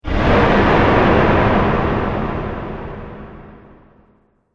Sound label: Drum Impact Movie Trailer